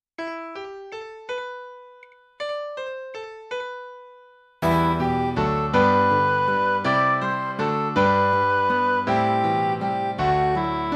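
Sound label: gospel acclamation easter sunday chant midi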